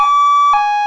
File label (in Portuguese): sirene Ambulancia